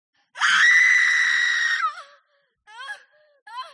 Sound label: woman screaming; sfx screaming sound effect; dragon studio